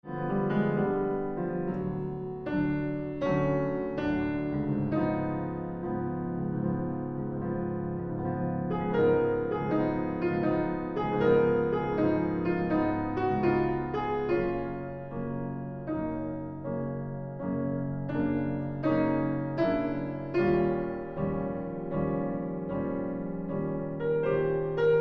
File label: blues